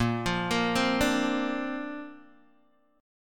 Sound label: A sharp Major Add 9th, Guitar, Open G